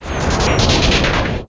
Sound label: Pulsing